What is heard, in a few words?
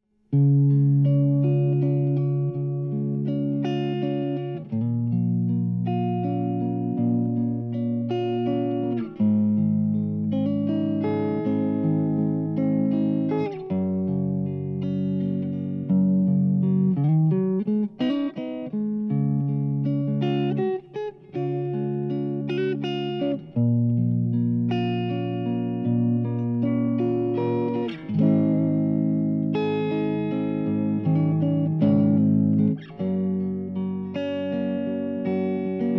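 headless guitar; 1988; steve klein; white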